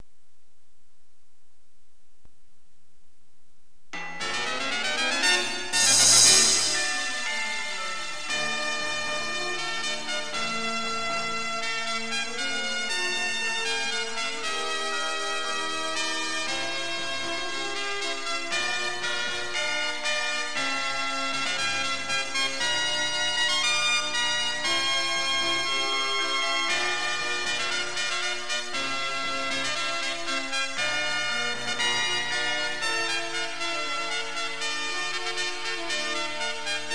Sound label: Fanfare; Supra Athletic